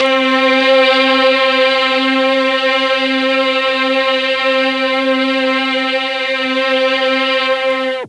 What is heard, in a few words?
C2 16 VIOLIN, mellotron; TRON 16VLNS